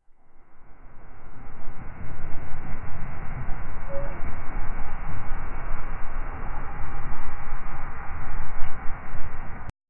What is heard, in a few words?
the sound of flowing wate, gurgling